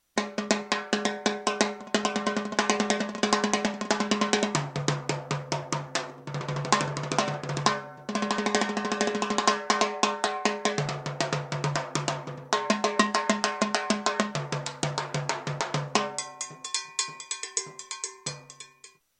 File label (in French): timbales